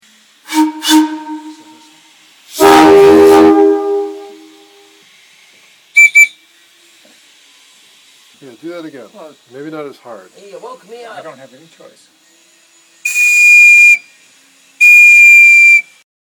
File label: Whistles, rail